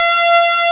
PPG 2.3; DIGITAL1 HYB

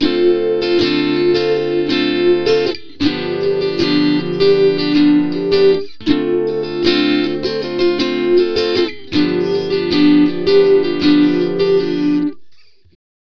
Rytm Gtr D, Slo Mo Funk, Lounge; Zero-G Kocktail Kollection; Construction Kitz (Lounge)

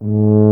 BRS TUBA, Solo Instruments

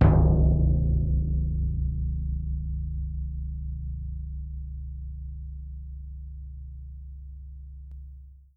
BD3, Percussion; Miroslav Vitous - Percussion & Harp

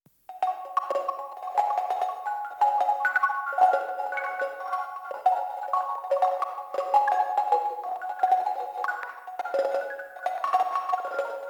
sine sprinkles, fx

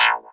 Stabs, Elektron MachineDrum

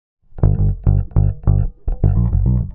105 Cmaj SUGARFUNK BASS; 105 BPM

